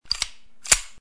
Carga pistola

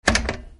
bajo

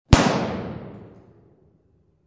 balazo real

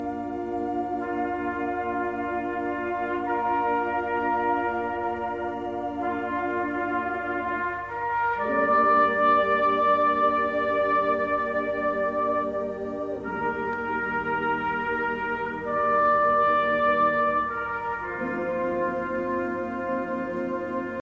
funebre

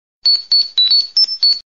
notificacion; zordon

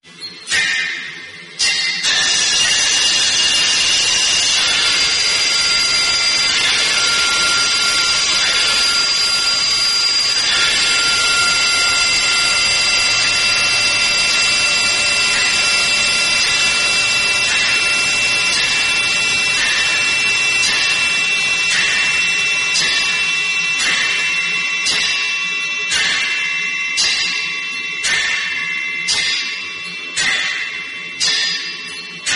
los carillones

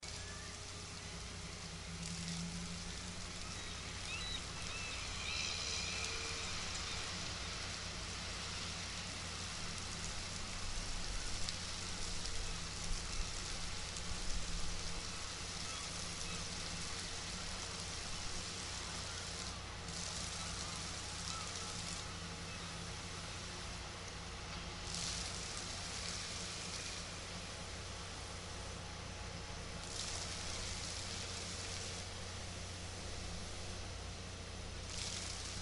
Bremerton dique

